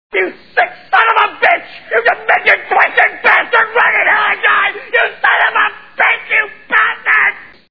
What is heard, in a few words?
sob; gearbox